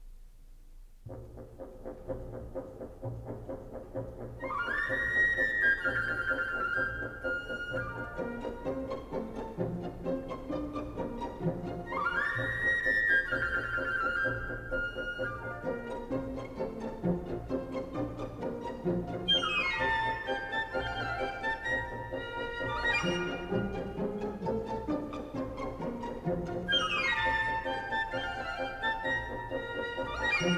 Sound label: divertimento tea chinese dance